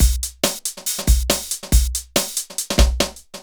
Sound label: Synth Loop BPM140